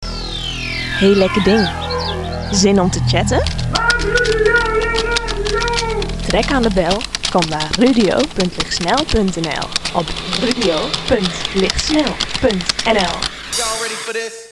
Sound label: jingle; 3fm